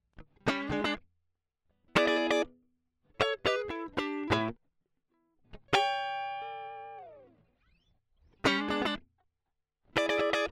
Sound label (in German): Funky Gitarre